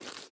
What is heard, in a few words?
ice jump; teardown; ps5 windows xbox series xs